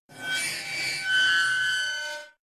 Saw Creak

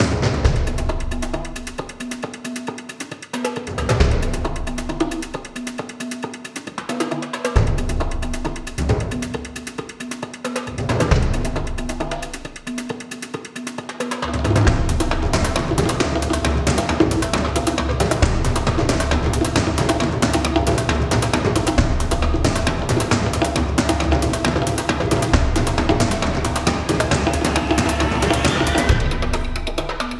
Big Percussion LOOP; Crossbow Warrior The Legend of William Tell

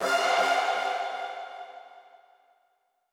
chime; PS4; Chime Sharp

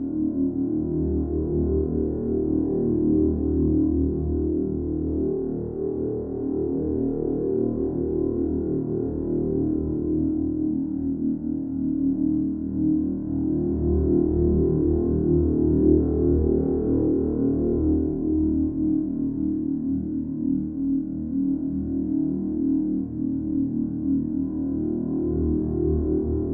Drone; blair witch volume i rustin parr

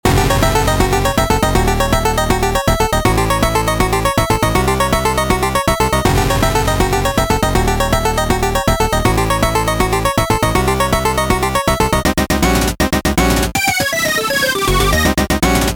Battle As Cave; Slime Tactics

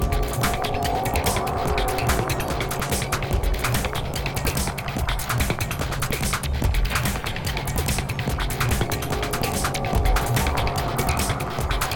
Music; Puddle

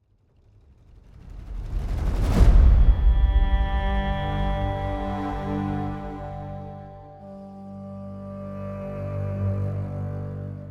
Sad Sunset Stop; project zomboid

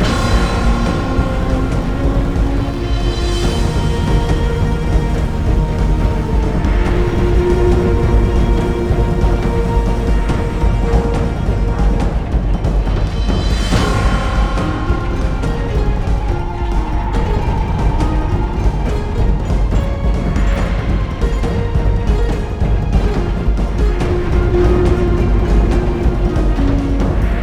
victory Loop; blightbound; xbox series xs

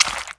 Impact Flesh Med; Puddle; puddle plus ios gamerip 2018

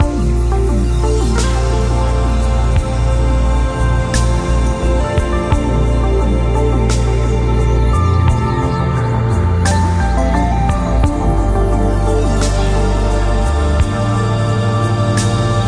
Redforest Ambient Altloop; Epitasis

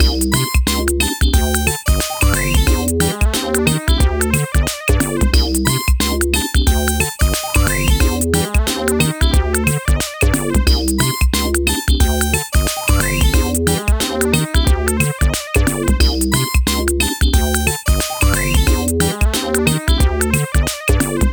Bgm Slot; Kotoba no Puzzle Mojipittan Encore

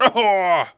clown hit; Ringling Bros. and Barnum & Bailey; Wii

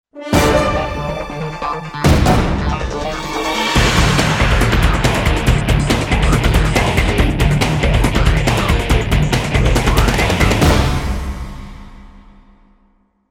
Combat Jingle Enemy; darkstar one; windows xbox 360

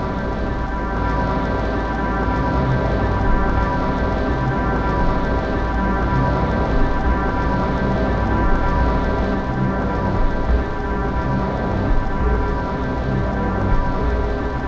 BGM Ambience; Future Cop L.A.P.D. 1998 PC

